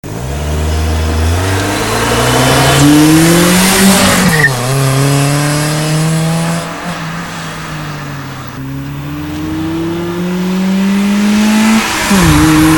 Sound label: supersprint 318ti